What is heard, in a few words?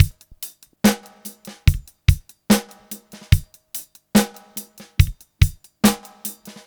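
72 bpm, beat loops; Zero-G; Monster Beats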